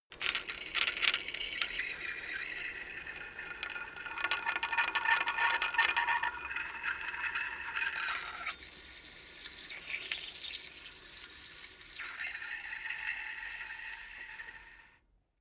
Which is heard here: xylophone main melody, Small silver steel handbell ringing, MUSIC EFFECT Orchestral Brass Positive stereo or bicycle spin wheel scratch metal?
bicycle spin wheel scratch metal